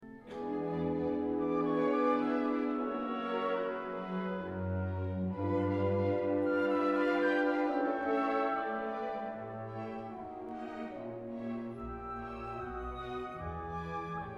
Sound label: Brahms symphony 4 Solo